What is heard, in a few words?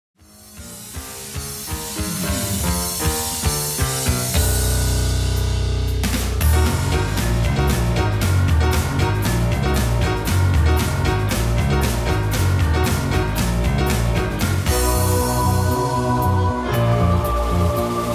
Clavinette